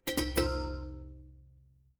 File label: feedback achievement